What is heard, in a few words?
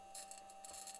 mic, mars2020 edlcam